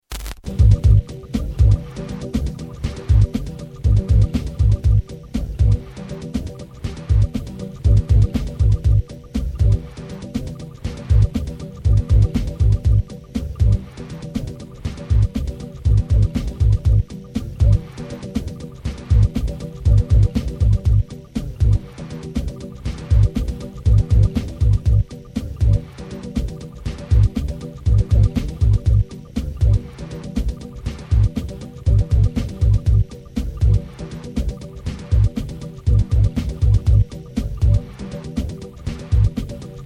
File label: synth beat